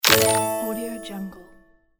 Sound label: eat egg prize